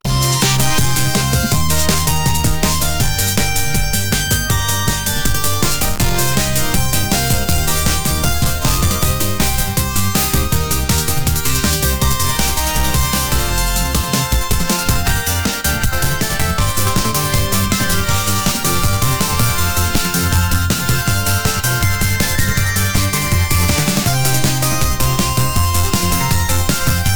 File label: Christmas synths; diffrhythm instrument generated